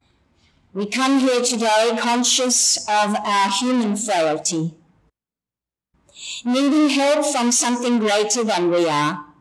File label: gross overload